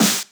Snares Electro

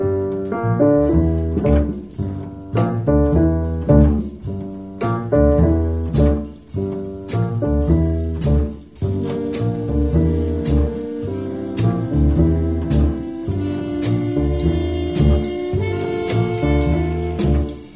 piano sax, loops, phonky

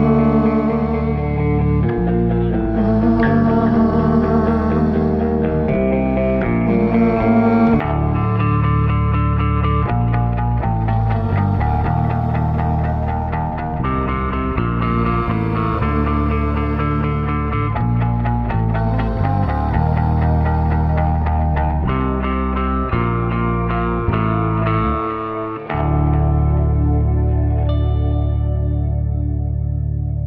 guitar and voice, Loops, Sad

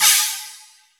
Cymbals, Electro

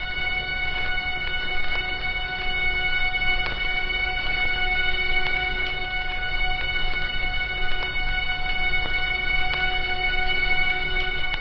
airy vinyl note, String

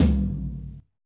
Toms, Real

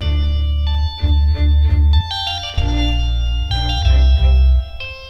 keys

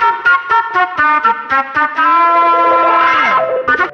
flute, Loops Phonky

